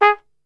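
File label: G#2, flugelhorn, brass; Best Service; Brass Super Section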